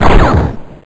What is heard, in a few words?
mk23 fire sil